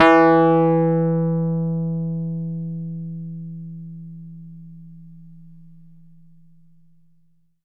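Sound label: E PNO E2 FF; Synclavier Sampler Library; KEYS AND GUITARS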